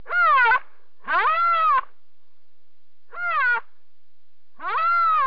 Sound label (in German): rhinozerus